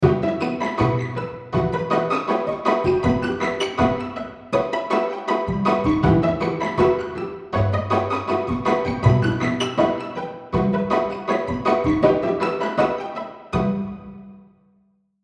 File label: arpeggiator loop